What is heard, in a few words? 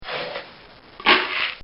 Scooping Grain